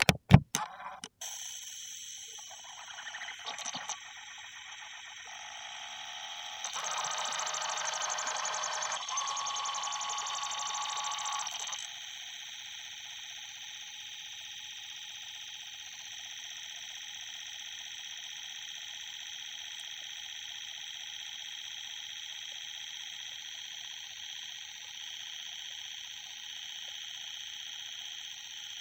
Technology Electronic Video Now Video Player Starting Up